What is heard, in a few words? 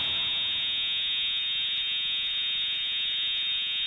irritating ambient buzz